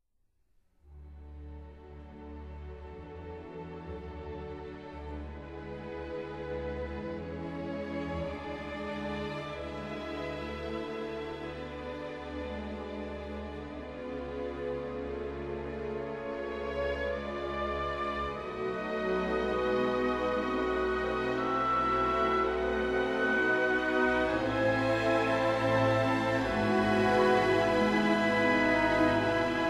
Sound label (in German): ariette orchesterfassung; liedergesang